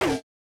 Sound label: tom; 音MAD; 少女终末旅行; 소녀 종말 여행 음원팩; Syaro Sample